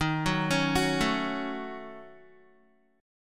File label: D sharp Minor 7th Flat 5th D sharp down Guitar; arpeggiated